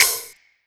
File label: normal hitclap; osu!